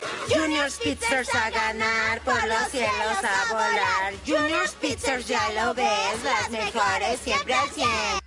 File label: Chant, Latin American Spanish; Junior Speedsters Chant; Season 1